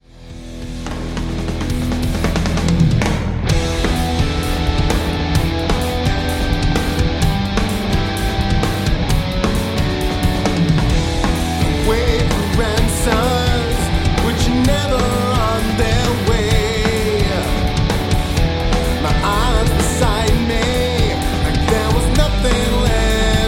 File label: SC-1 Chorus